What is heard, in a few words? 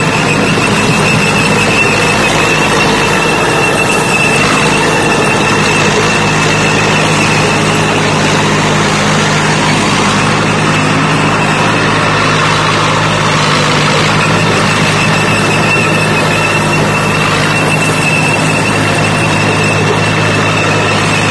tank move backward